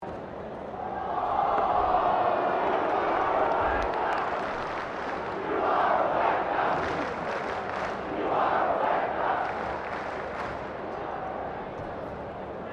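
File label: crowd 20K Melbourne Cricket Ground angry chant, Cricket at Melbourne Cricket Ground; Crowds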